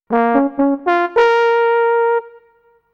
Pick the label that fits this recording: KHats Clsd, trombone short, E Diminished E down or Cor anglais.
trombone short